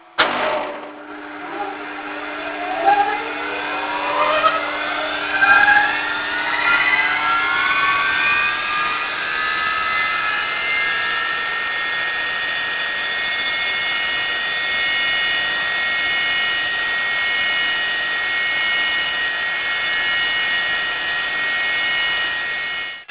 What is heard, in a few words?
drm run up